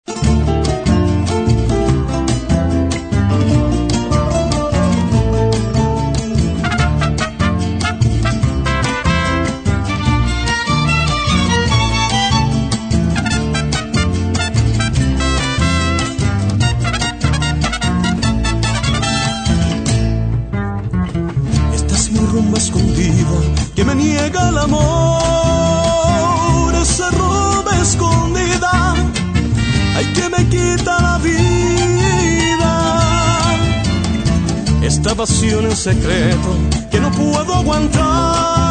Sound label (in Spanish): rumba